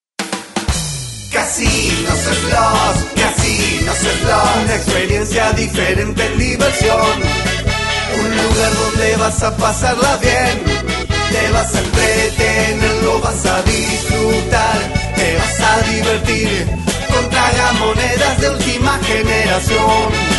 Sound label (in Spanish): jingles